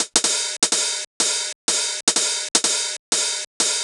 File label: drum clapper hat 125, Classic Chicago House Drum Loops